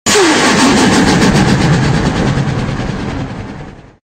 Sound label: Stunned sfx; MrScrewy; Die of Death